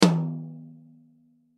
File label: Drum Hit Tom High; Drum Kits; Blastwave